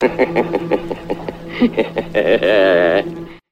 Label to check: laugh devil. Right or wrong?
Right